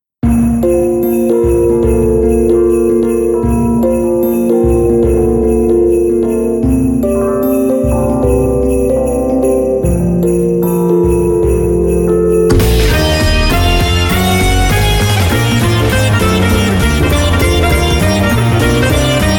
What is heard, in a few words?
bgm acoustic; maou; クリスマス波動音楽2; クリスマス充電をした音楽です